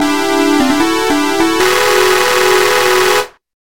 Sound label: Battle Won Fanfare